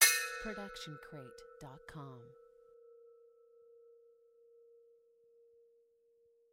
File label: Metal Hit; soundscrate